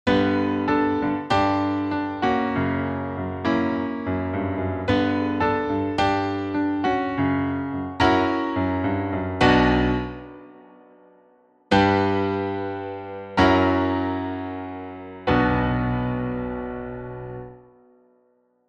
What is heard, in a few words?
prechorus wants b minor